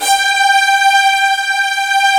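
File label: orchestra strings G1; GRANDSTRG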